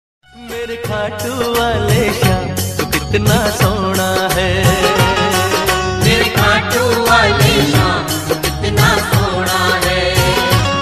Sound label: song ringtone, bhakti ringtone; khatu shyam; shyam baba